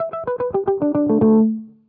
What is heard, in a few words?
guitar muted, loops; Phonky